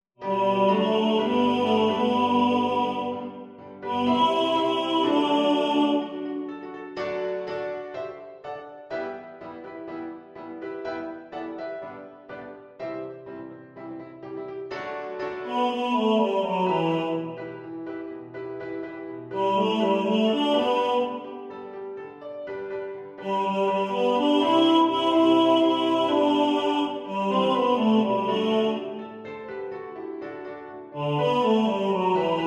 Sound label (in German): Tenor 1 im Vordergrund mit Klavier, Männerchor; zum Üben